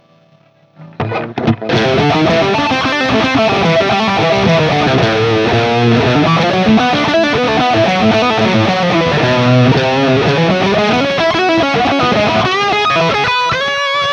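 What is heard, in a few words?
Guild Liberator Elite Brown Sound Riff, guitar; 1988 Guild Liberator Elite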